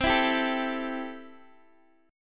C 6th, C, down, Guitar, Open G

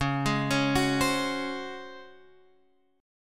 C sharp Major 7th Suspended 4th

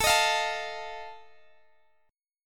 F 7th A

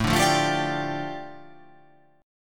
A 7th Suspended 4th Sharp 5th, A, down

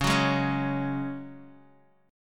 C sharp Suspended 4th, Guitar, Open D

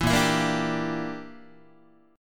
Bb 6th Flat 5th Guitar, chord; Bb6b5 strummed

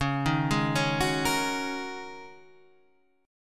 G sharp 9th Suspended 4th C sharp

